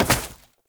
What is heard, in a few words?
Step Vegetation, Foley; Warframe; Oraxia